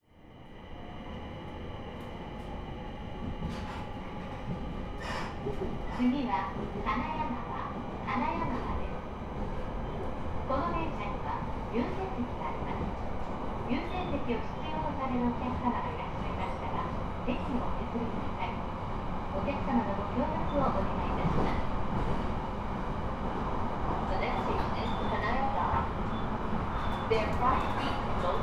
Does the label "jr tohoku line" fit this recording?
Yes